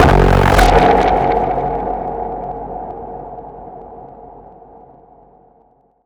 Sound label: impact oneshot; GhostHack